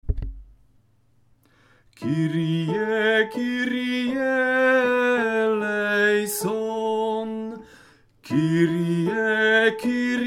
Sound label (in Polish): kyrie sopran